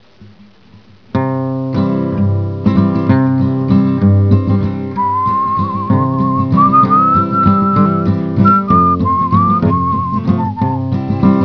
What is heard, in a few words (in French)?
PIKING, guitare